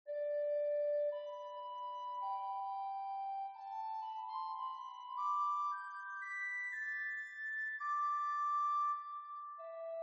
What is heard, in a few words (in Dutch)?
roerfluit 4